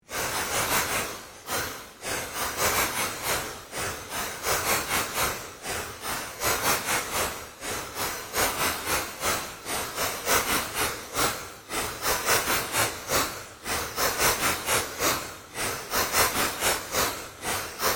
Created Fluctuation Drone, Drone Sound Effects; sound building blocks created through manipulation of raw material

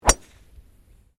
GOLF DRIVE DRIVER; Various Golf sounds; Sports

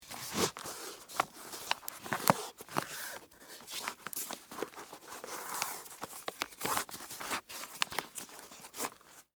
Foley, Boot, Tie, Laces; Dressing; Human; BlastWave

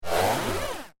Jagged Laser Charger, laser sound effects; science fiction sound effect; star wars / trek style games

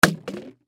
Drink Bottle Drop; Drops; Objects; Sports

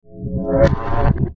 WARP WARBLE, Warp Warble Suction Reverse; Production Element Imaging Element Accent Transition

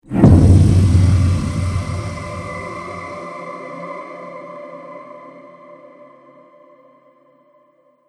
Trailer Hit Alien Horror

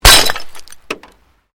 Glass pane puncture; Material components for constructing more complex sounds

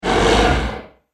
Horror Beast One Shot, monster; Monster Sound Effects

